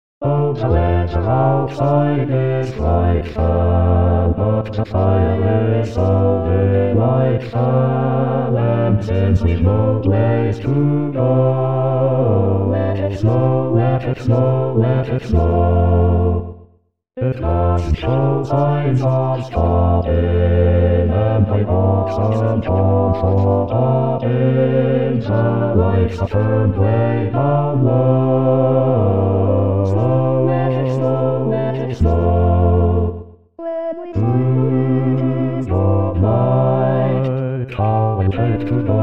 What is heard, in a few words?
Bass